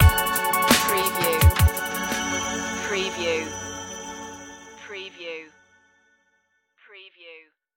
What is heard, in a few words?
velodrome stinger